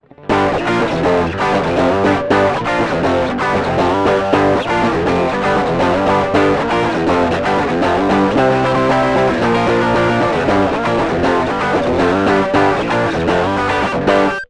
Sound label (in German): fame tube steamer halber drive schnelle chords